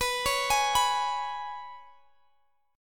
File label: G sharp Minor Double Flat 5th B